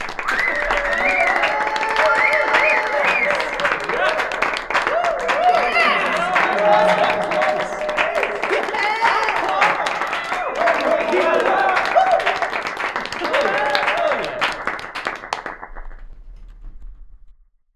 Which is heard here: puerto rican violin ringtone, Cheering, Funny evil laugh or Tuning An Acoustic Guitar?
Cheering